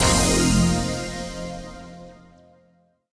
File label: medium Win Autoplay; king of 3 kingdoms